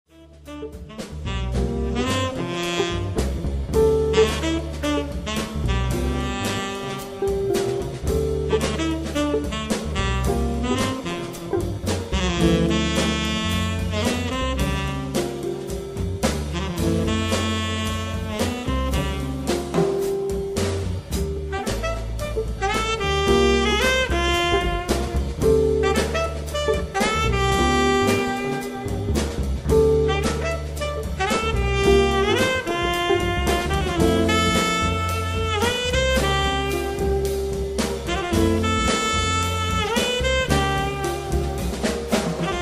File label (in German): Trio plus Sax Funk